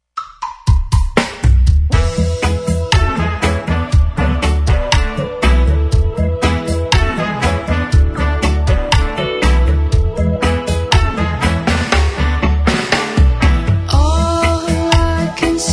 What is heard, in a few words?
Reggae Cover